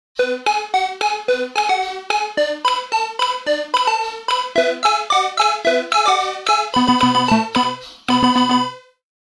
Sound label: t phone ringtone